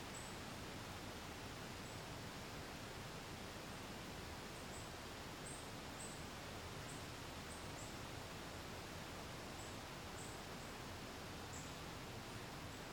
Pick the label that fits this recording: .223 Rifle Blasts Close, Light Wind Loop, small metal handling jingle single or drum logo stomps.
Light Wind Loop